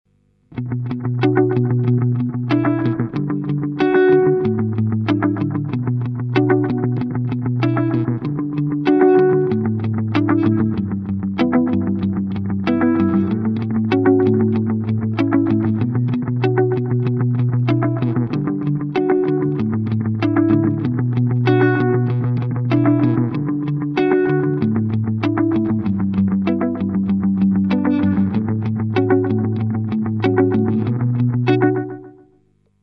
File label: Seymour Duncan Vapor trail short delay